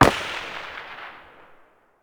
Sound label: M4A1 muzzle mono far